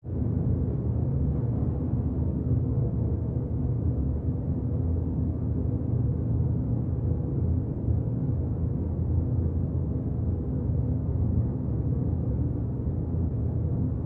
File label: Ambiance Bass Air, drones & tones